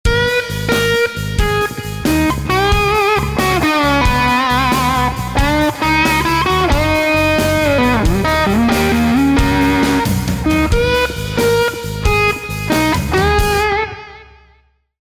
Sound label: Fender Road Worn 60s Strat EP3 Dunlop Germanium Fuzz Face Mini Fender Deluxe Reverb